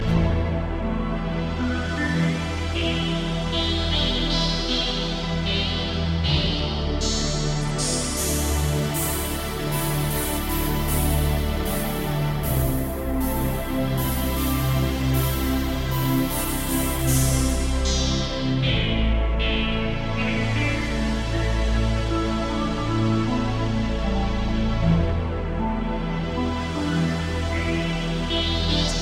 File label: Relaxed